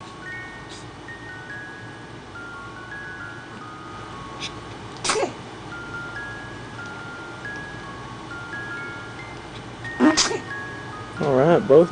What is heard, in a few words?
the sneeze